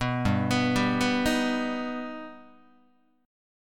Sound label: G Augmented, Guitar; G+ arpeggiated; 2,3,2,5,3,2; 4A,4E,5A,5D,5G#,6C#